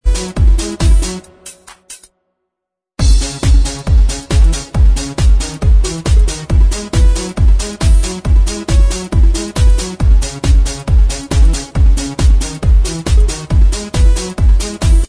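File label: instrumental track, backing tracks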